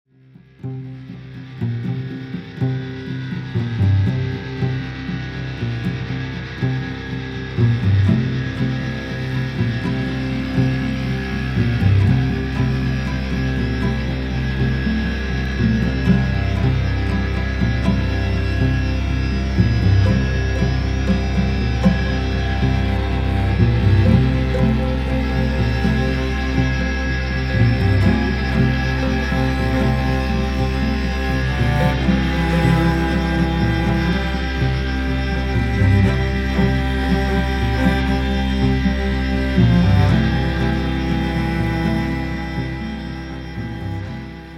Pizzicato Spring Blues Drone; Stockholm

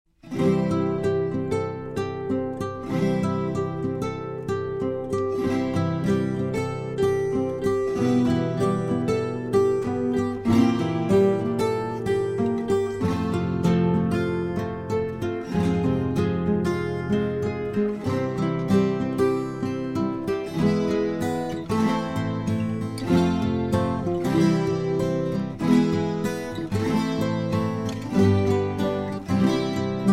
Instrumental

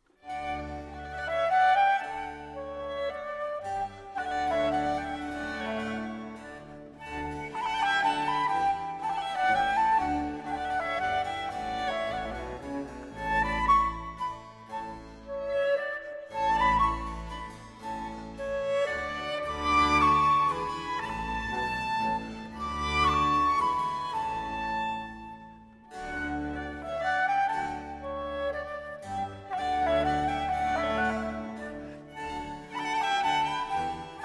Flute Concerto in D Major, Largo; Vivaldi; II. Largo

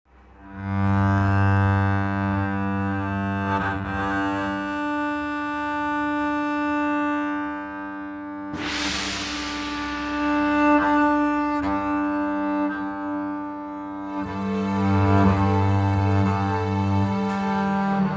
String Ensemble

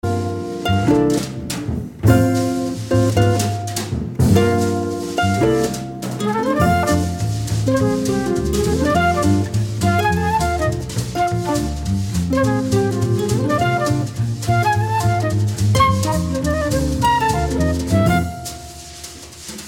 Aeolian Groove

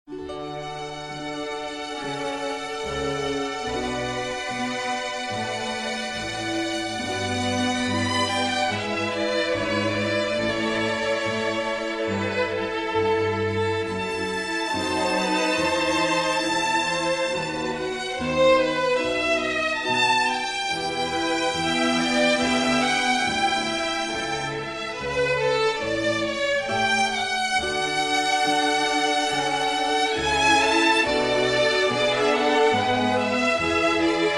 Suite in D Major, Air on a G String

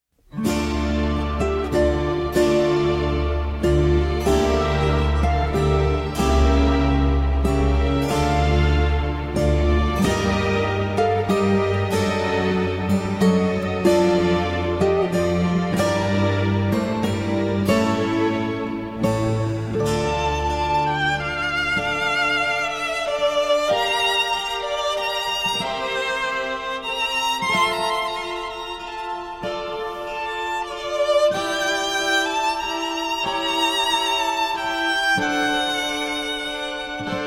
Concerto For 2 Violins and Cello in D Minor; Op. 3, No. 11, RV 565; Vivaldi

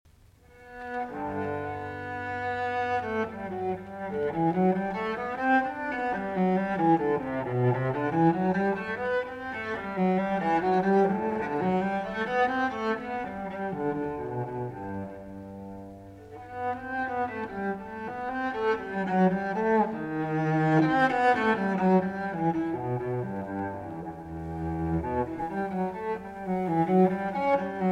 Cello Suite in G Major, Allemande; Bach, JS; No. 1; BWV 1007 II.

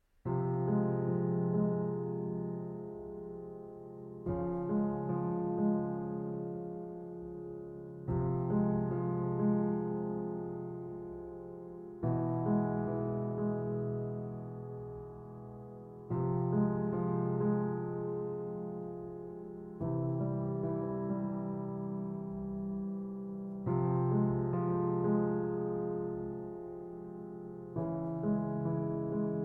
Études For Solo Piano

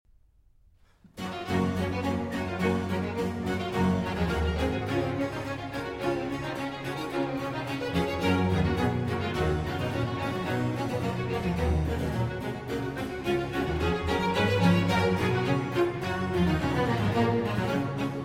Concerto in G Major

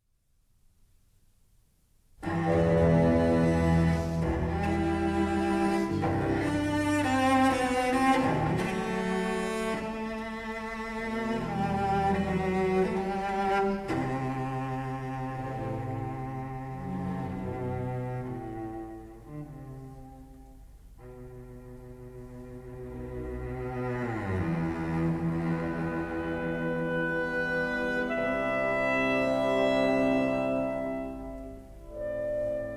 Cello Concerto in E Minor, Adagio - Moderato; Elgar; Op. 85

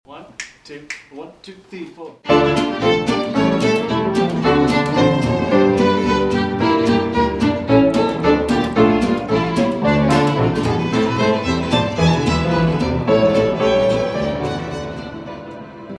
Double Violin Concerto, Gypsy Swing

